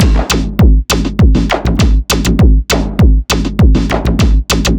distorter 100bpm

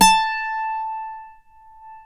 BOUZOUKI A4; AKAI S6000 CD-ROM - WorldSounds

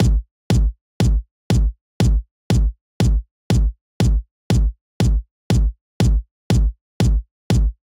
Kick, Nu Disco; Zero-G; Construction Kits; Legacy

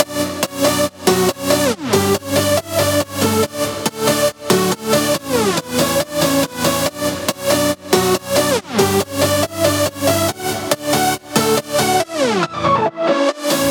140BPM B; Vengeance - Essential Clubsounds Vol.4

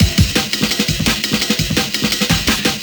170 Break, Loops; M8

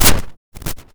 Shotgun Snare, drum machine; 300 Drum Machines; DevSnd

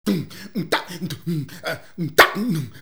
VOCDRUM, MOUTH PERC; Best Service; Hyper Dance

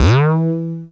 bass E, Talking Bass, Dance Synths

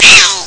japanes cry; Amiga Soundtracker Sample Packs

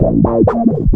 123 FRESH; USB Soundscan vol.20; Fresh Disco House I; AKAI; DISCO ADD

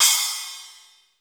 DRY CY CR, drum; Crypton; DrumBase5000

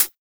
Closed HH

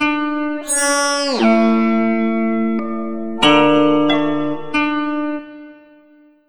KOTO LP FX, Asian Traditions; USB Soundscan vol.60; AKAI; KOTO FXLP

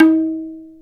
Pakawaj Open

